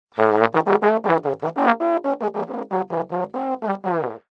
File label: Cartoon Trombone Song, Very silly horn melody; Comedy/Cartoon; Funny Sound Sound Effects; Lynne Music